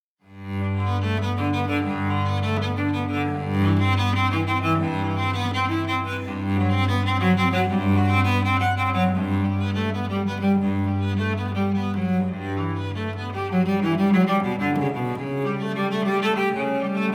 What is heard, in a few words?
cello suite no 1 in g major prelude; johann sebastian bach; bwv 1007